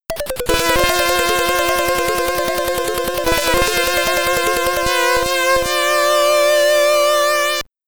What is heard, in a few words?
Mission Accomplished, SID Stereo, commodore 64; Operation Wolf